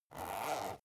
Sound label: zipper use slow short